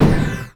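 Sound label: Item Weapon Wand; FlyForInsanity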